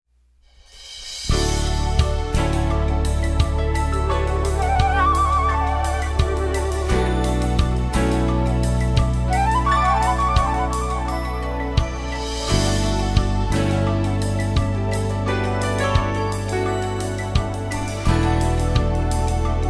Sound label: wind jammer, jazz